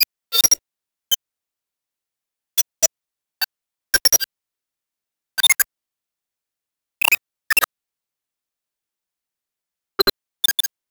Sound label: collections of ui; assemble